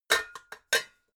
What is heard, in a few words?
pots put down